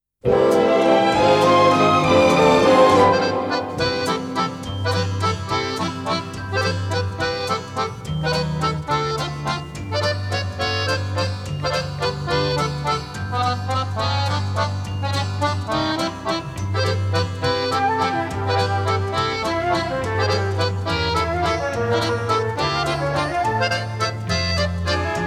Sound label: Strings Orchestra Accordion